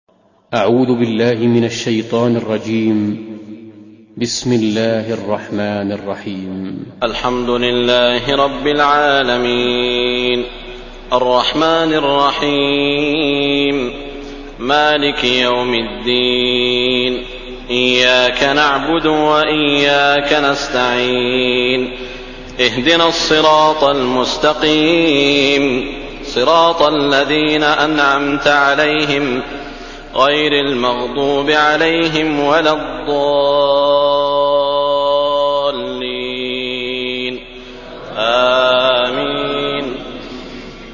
Fatiha, kuran; sudeysi sureler

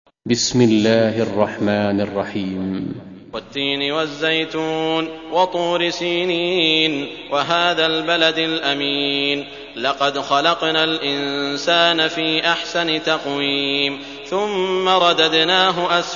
Tin, kuran; sudeysi sureler

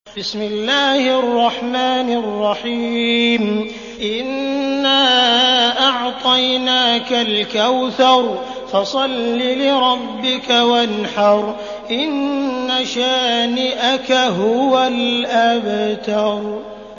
Kevser, kuran; sudeysi sureler

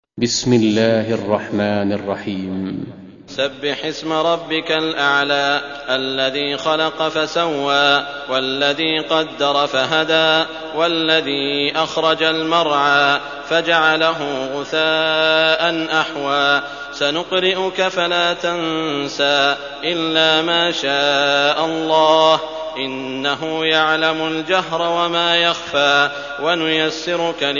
Ala, kuran; sudeysi sureler

